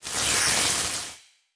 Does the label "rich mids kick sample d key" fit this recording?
No